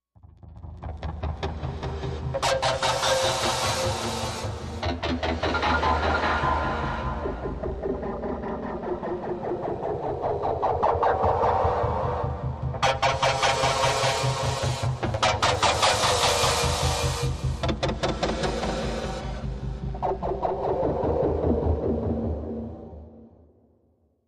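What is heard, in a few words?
Dread Alternator Machine, alarms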